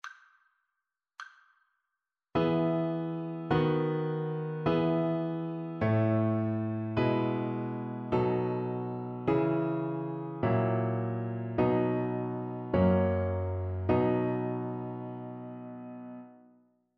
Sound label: harmonic dictation